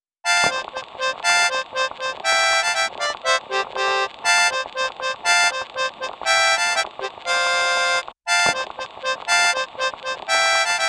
Gramophone

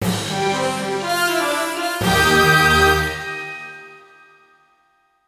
sleepy tone; Summon Night Exthese

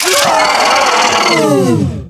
Jump Scare Vocal; The Inn; Zara; Devour

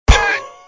Pigglette death

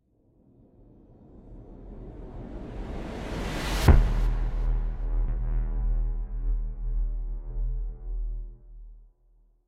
Transition; Terra Invicta

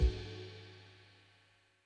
Loop Tail; Good Job

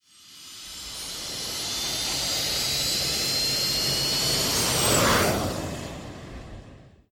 Takeoff

